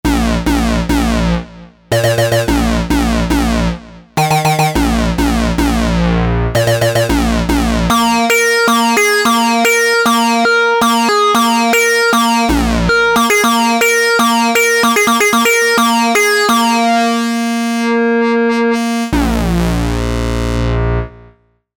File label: Bass